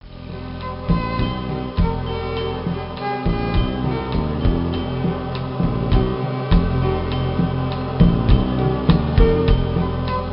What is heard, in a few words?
Bkg Music; Touchmaster 4 Connect; 2010 nds gamerip